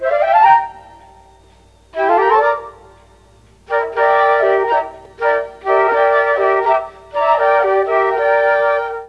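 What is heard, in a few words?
G3 CHA CHA FLUTE, Mellotron; Mike Pinder - Mellotron Archive CD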